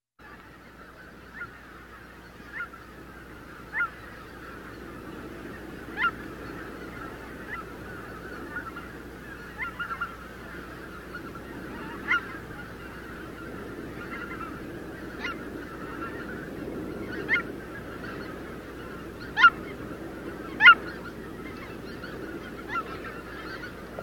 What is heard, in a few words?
Swans And Geese; Timdra